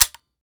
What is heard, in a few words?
dry fire, mini14; weapons; Urban-Coalition; Decay; arccw ud